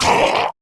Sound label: Pain, Grineer Beastmaster, Barks, Battle